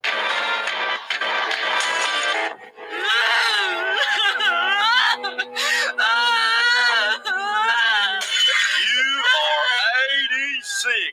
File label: crying